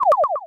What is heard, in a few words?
Cry; Pokemon Solar Light & Lunar Dark 1.0.1; Cries